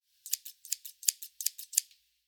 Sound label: scissors